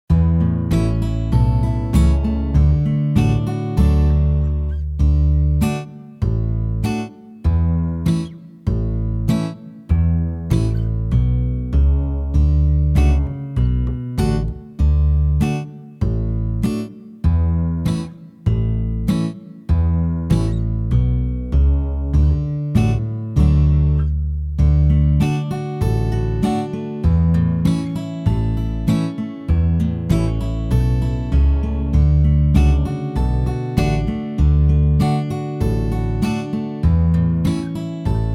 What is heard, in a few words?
ennstaler polka 2 knopfreihe